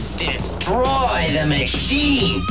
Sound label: pain; alienarena; johnny5